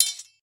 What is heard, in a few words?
sword hit